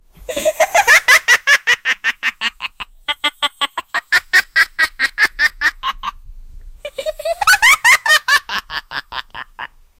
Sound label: monkey laugh; primate